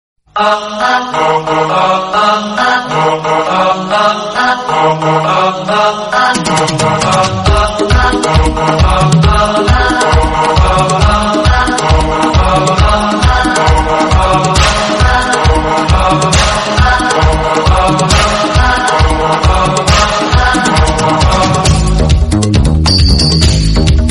instrumental bgm

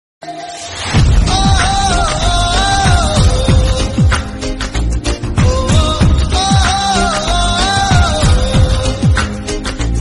bgm tamil; sachin movie

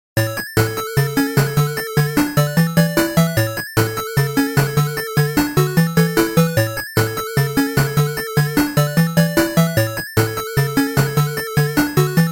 chiptune; charms

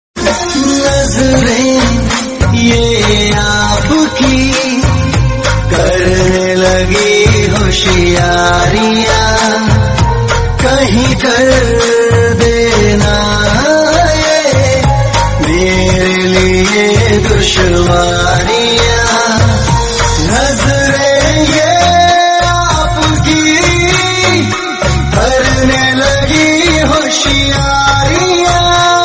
lofi romantic hindi

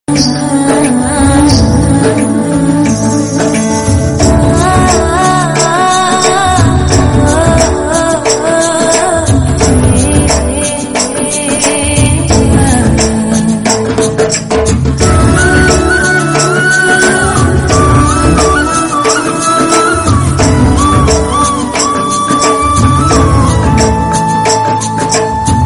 love failure bgm; 7am arivu

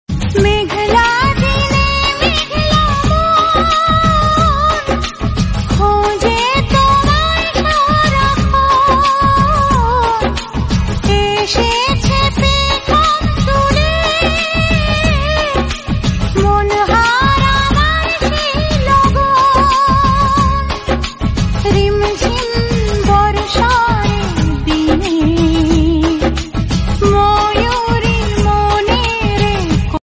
sad lofi bangla

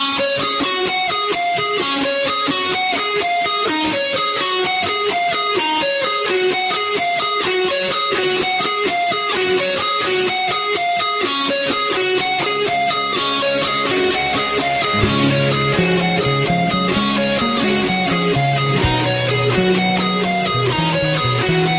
instrumental

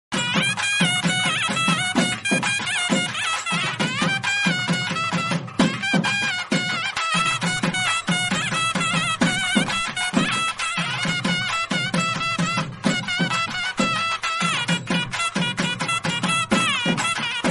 naiyandi melam

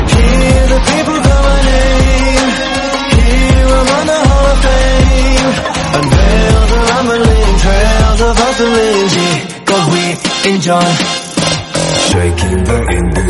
korean song

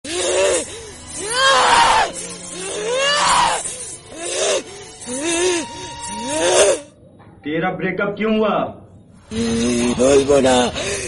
horror bgm; bhool bhulaiya; manjulika

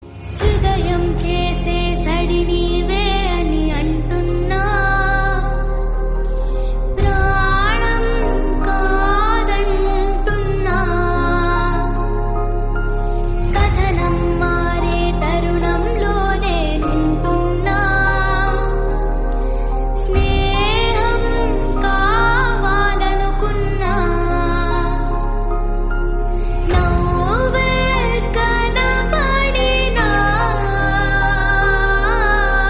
telugu sad; vadhina

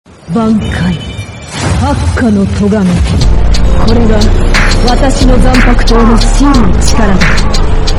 korean remix song

